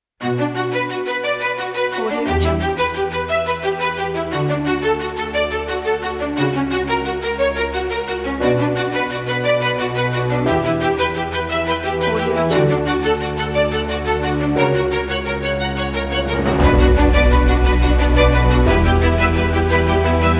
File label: inspiring violins music